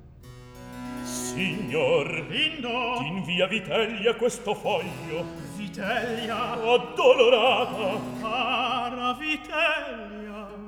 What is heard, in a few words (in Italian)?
Recitativo; Atto 1 - Scena 12; Lindo, Geminio; Signor! - Lindo!; Antonio Vivaldi (1678 - 1741); Vivaldi - Tito Manlio (2005)